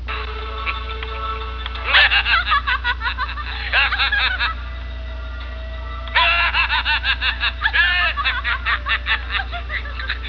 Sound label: hahaha